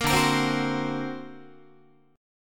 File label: D Augmented 7th D down Guitar; D+7 strummed; JGuitar